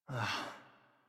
Breath Relieved, Adult; Warframe; New War; Adult Operator

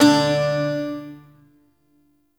Shawzin Oct One D; Warframe; Duviri Shawzin

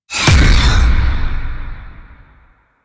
Void Storm Hit; Warframe; Railjack

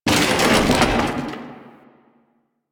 Chair Destroy Misc; ambience; Warframe; Entrati

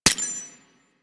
Mech, Weapons; Tenno; AK Bolto Prime

